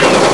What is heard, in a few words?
uzi; CyberBlast